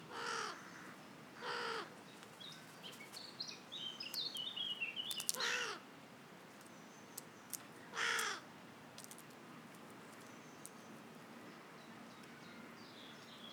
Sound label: Corvus corone; SETE